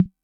snare; MFB-522

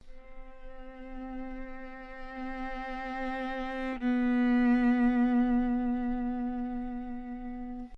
viola C4 very-long mezzo-forte arco-normal; solo instruments

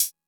hat; MFB-522

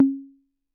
tom; MFB-522

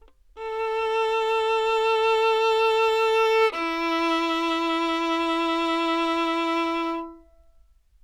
Two Note, violin

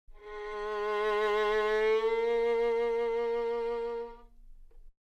violin Gs3 phrase mezzo forte arco glissando; solo instruments

